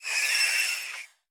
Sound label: Death